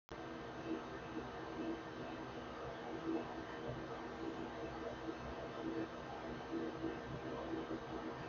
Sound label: slight muffle low, 3dprinter fan noise